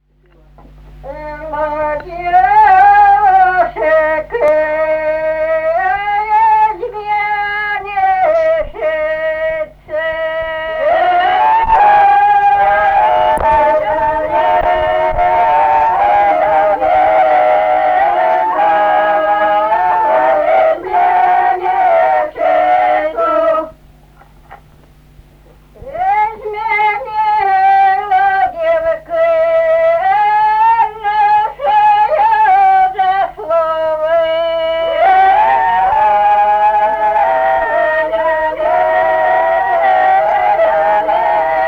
карагодная; Доброе; Была девушка-изменщица

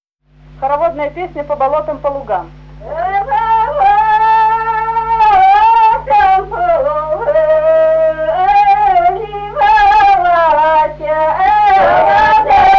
По болотам, по лугам разливалася вода (карагодная)

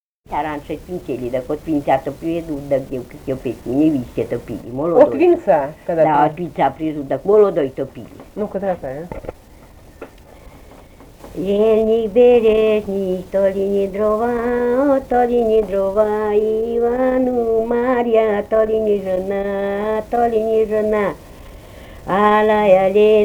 Ельник-березник то ли не дрова (свадебная); Усть-Вотча

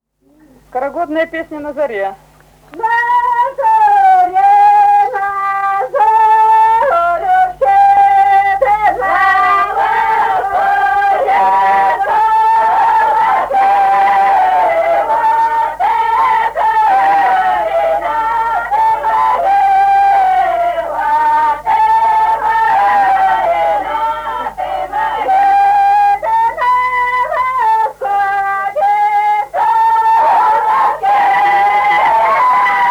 На заре, на зорюшке (карагодная); Песочня

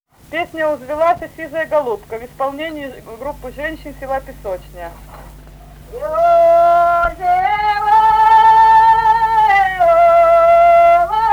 Узвилась сизая голубка (протяжная); Песочня

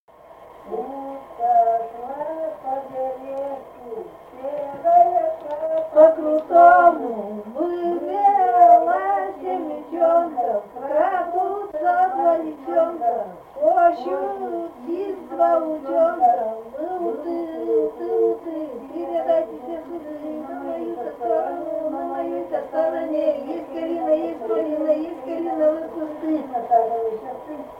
наложение; Белое; Утка шла по бережку

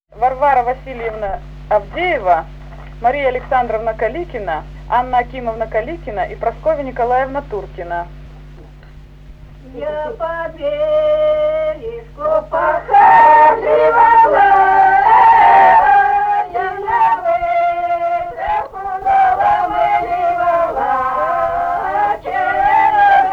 карагодная; Я по бережку похаживала

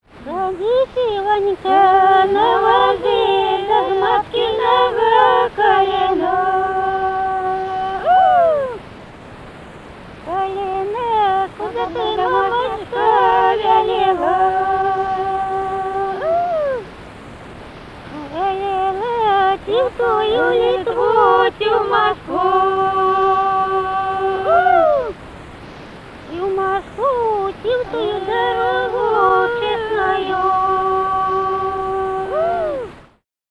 свадьбишная; Садись, ты, Ванька, на возы; Кирилловка

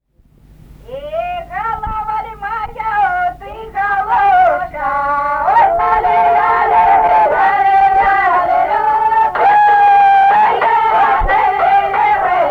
плясовая; Песочня; Э голова ль моя, ты головушка

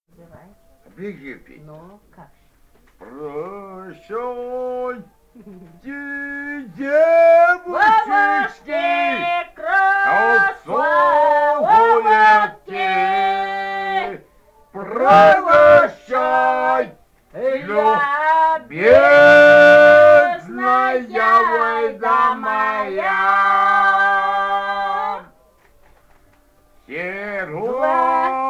Прощайте, девушки-красотки, Харацай

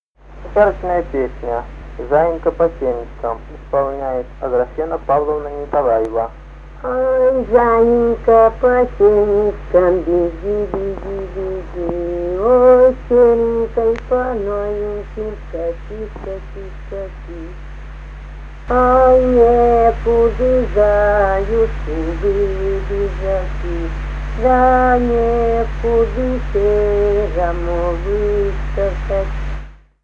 Ой, заинька по сенечкам; Маковское

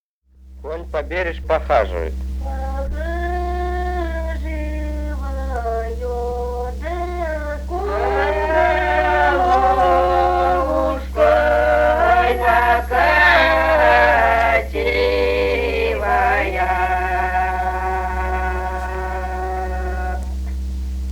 Конь по бережку похаживаёт, Подгорное

